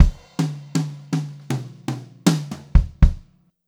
FILL, Brushed Drum Loops

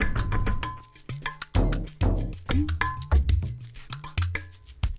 Chunk Vase, Drums; Sonic Foundry (Sony Creative Software); Friendly Fire; Marc Steve

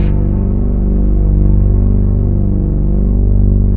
BREATH BASS; MX+BASS

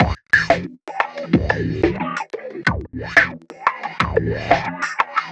Orbish Funk, Synchro Funk, Beats

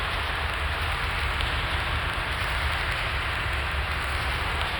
Cracklature, sparse soundscape

